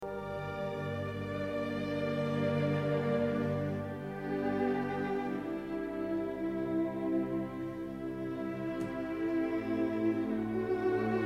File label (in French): choeur adagio; deuxième partie – adagio –; Beethoven